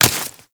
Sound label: Staff Grab Idle; Warframe